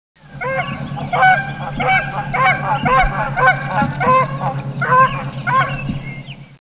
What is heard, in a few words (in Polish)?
bernikla kanadyjska